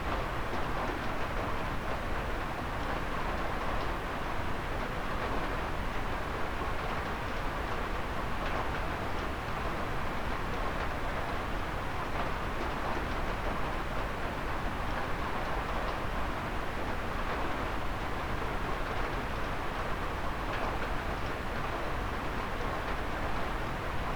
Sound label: rain; amb; AfterStory; Doki Doki Literature Club